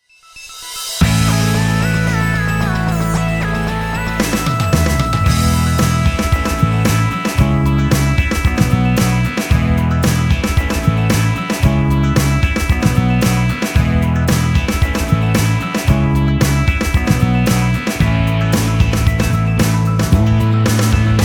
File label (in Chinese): 欢快的背景音乐; 3分以内